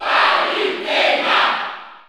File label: Cheer French; Palutena; SSBU